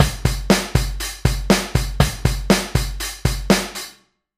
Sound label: drum